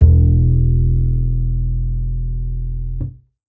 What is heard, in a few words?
bass acoustic